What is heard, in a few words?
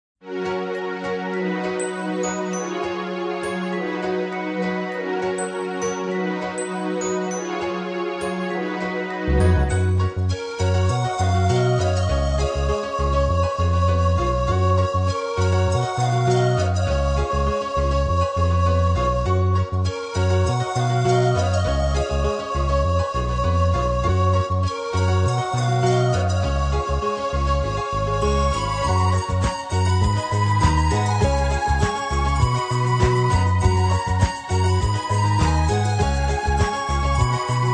instrumental; ymnoi